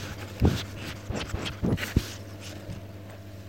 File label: Huffing Unhappy Threatened